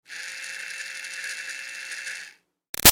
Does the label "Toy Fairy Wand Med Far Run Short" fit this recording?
Yes